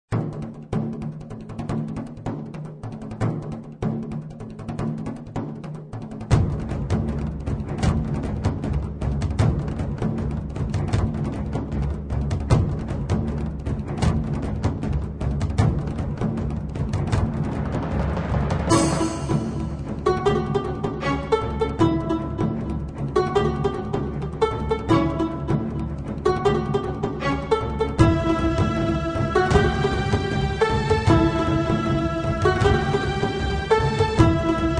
Background

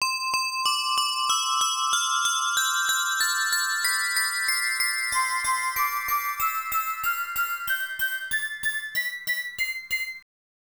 Sound Check; Bucky O'Hare; Konami beat em ups; arcade

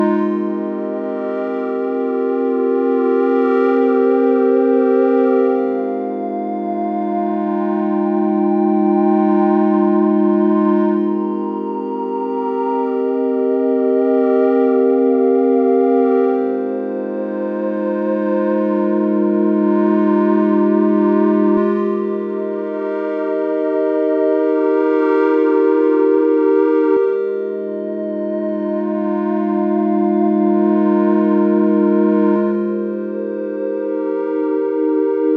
Summer Synth; Proteus

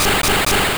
Karate Chop; sfx; attack moves; pokemon; gen 2; gsc

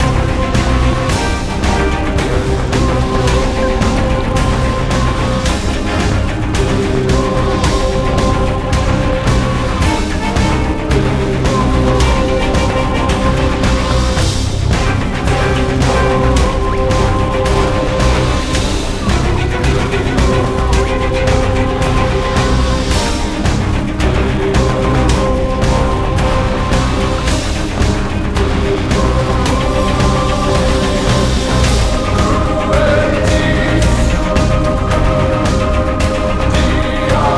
Fight

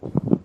Player Walk footsteps mat Walk; SFX

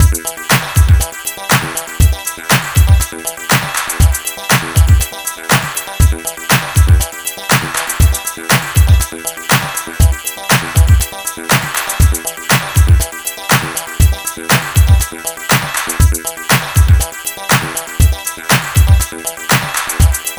Music Loading

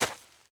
walk grass

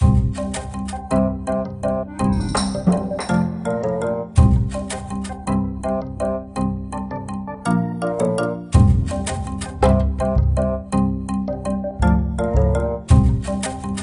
Jungle Level

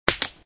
stone break; Tempala

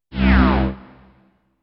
Equipment Level Down; dot hack

